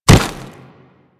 Step Snow, Footsteps; Enemies; Kubrow; Warframe

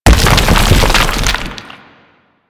Thumper Bot Thumper Impact Frack Rumble F; Warframe; Grineer

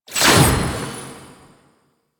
Rune Pickup; Warframe; Entrati; Mirror Defense

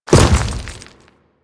Step Dirt, Footsteps; Warframe; Grineer

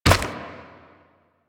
Proto Infested Runner Step Default

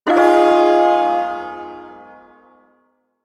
Ersatz Shawzin Min Chord; Warframe; Duviri Ersatz Shawzin

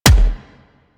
Weapon Punch Rifle

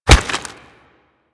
Infested Bone Blade Scatter Shot Proj Impact; Warframe

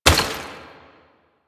Step Water, Footsteps

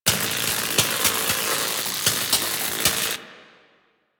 Tesla Coil Loop; Ambience; Warframe; Corpus Gas City; Gameplay Remaster

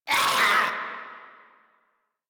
Infested Runner Attack Call; Warframe; Runners

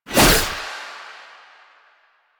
Skana Whoosh; Melee; 1999

